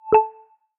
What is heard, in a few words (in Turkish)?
realmi mesaj sesleri